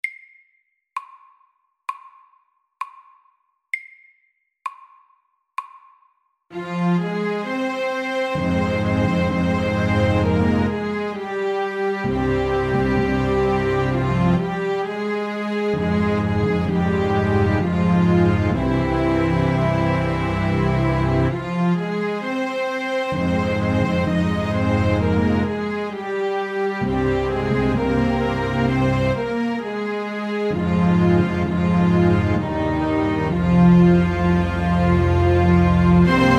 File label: Lament MEDIUM 65